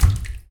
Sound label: bathtub step; Golden Crayon Sounds